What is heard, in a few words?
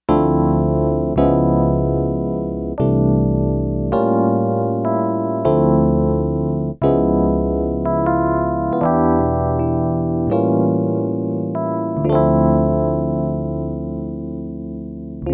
DX7 EP, Yamaha DX11